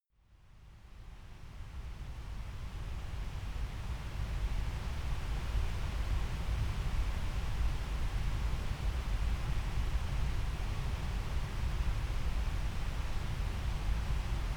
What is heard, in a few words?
stream distant averted